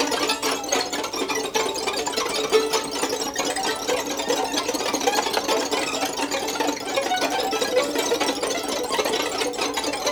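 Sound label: VLN COL LEGNO, Violin; Q Up Arts; Denny Jaeger Master Violin Library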